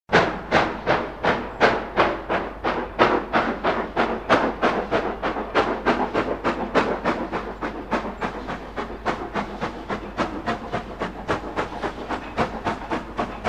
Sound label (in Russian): Звук поезда ждущий на станции